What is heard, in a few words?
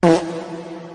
pet; GameJam-polytech